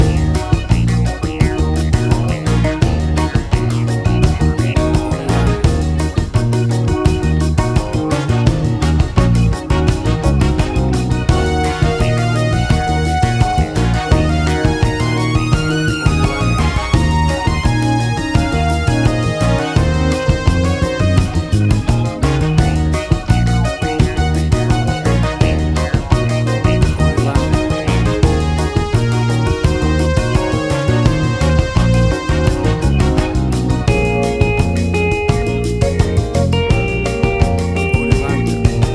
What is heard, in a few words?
Acid Jazz Latin Fusion Music